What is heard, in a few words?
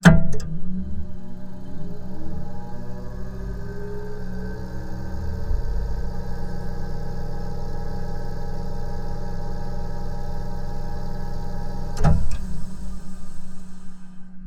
Tape machine fast forward spin stop; SkewSound; reel2reel